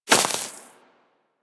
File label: Rodent Hop Step Rear Grass, Forest Rodent Footsteps; Characters; Foley; Warframe